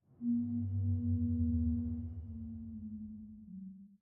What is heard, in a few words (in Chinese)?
水下鲸鸣低声; ambient